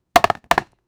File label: great axe chopping bones, melee